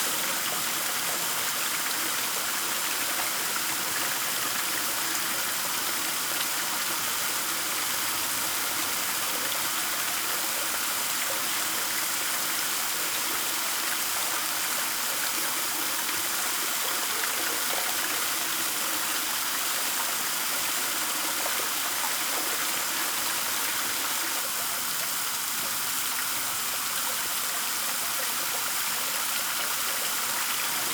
FOUNTAIN Large, Splasher Spray at Playground, 3m Far; Articulated Sounds; 100 Fountains